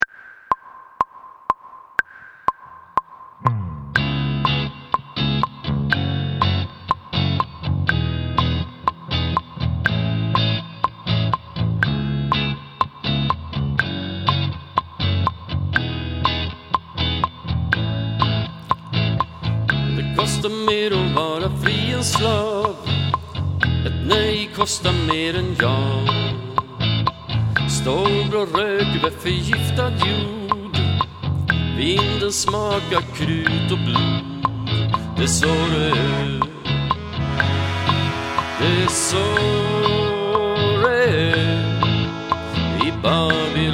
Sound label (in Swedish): metronom